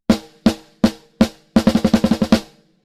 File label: snare; SplitEQ; TRANSIENT Separation